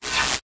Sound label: magic wand zap spark power; zapsplat